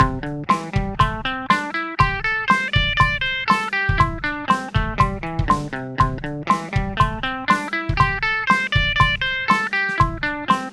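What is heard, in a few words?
A minor #5 120 bpm eighth, guitar; A Minor Pentatonics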